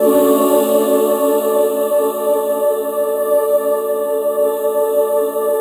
Choir, Keys, Pads

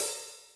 hi-hat, Millenium MPS-400

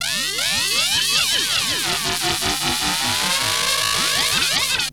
Korg Prophecy hits, arps and fx

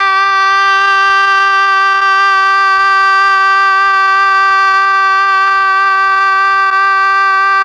MEL OBOE

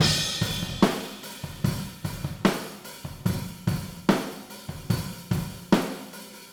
Big Bottom Chorus Overhead ST, Drums; Sonic Foundry (Sony Creative Software); Drums from the Big Room The Mixes; Demo Projects

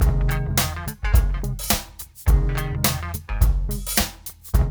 mix, funky loops; East West; Funky Ass Loops

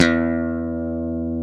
GTR Funky Strat, Funk & Mute

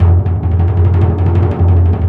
Orchestral Perc, Timp FX; Roland LCDP03; Ambo TimpRol

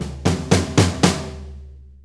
6-8 Fill, Drum; Drum Tools

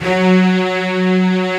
STRING SECTION F#2, Strings; AKAI S6000 CD-ROM - Volume 1

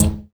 Hi Hat, drums; Sonic Foundry (Sony Creative Software); Drums & Drones; Construction Kits; Sludge Kit

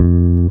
E bass (F long); Sonic Foundry (Sony Creative Software); Ugly Remnants VOL-2; Basstuff

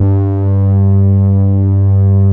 PAD SOFTS; Pro-Rec - Synthsonic

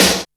Snare, Boss DR-660, Drum Machines

